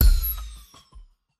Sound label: Metal